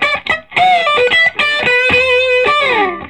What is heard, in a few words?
SOLO, Vintage Blues Guitar; USB Soundscan vol.22